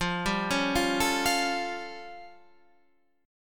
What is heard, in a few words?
A Augmented 7th F